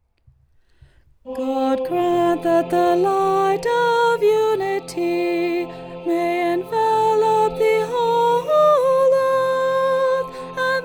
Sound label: soprano